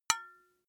glass metal small impact damped

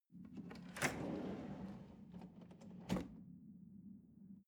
drawer large trash open hard

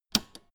old button press jump out fast

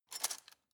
cutlery plastic drawer grab

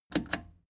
plastic button scratch smooth click disable fast

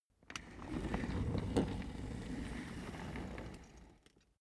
office chair trundle slow long

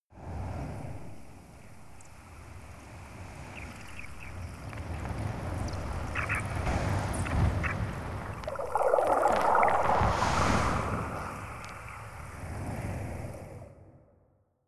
water fx random

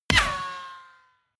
bullet large impact ricochet

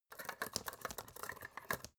folder clamp handle

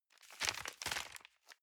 envelope swap multiple

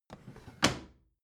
bathroom drawer close regular

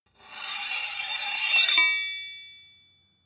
sword blade grind long

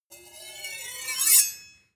sword blade grind swish long

